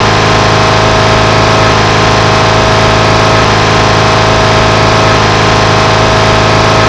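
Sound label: petrol 4 cylinder; balladeon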